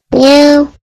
Miau

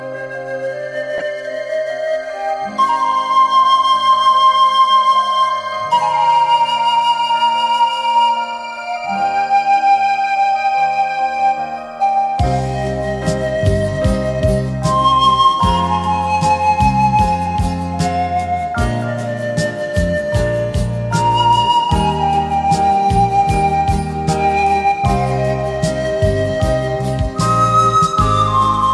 lonley flute

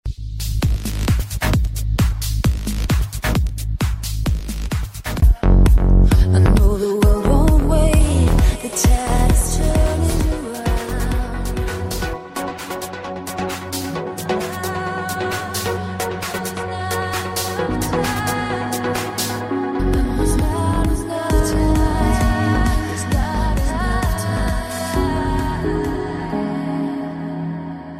Trance

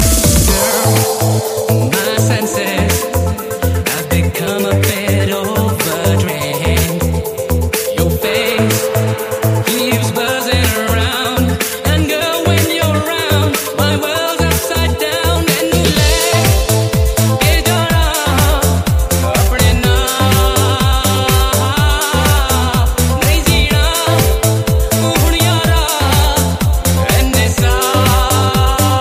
Hip Hop Mix

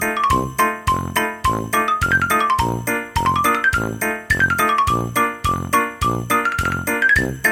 happy xylophone

